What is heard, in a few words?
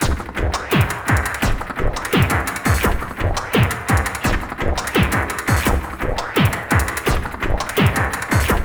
SPIT BD, SPITTING HATS; Zero G; Malice In Wonderland